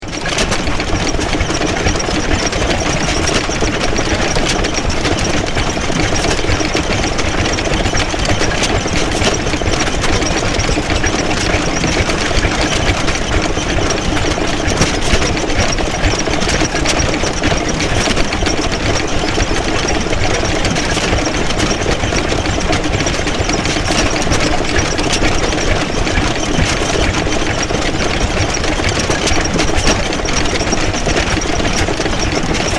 Detailed sound of old vintage motor spluttering and eventually grinding to a; Detailed sound of old vintage motor spluttering and eventually grinding to a halt.; Machines; Rickety Old Engine